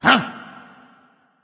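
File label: nonverbal surprised; smite; voicepack; ullr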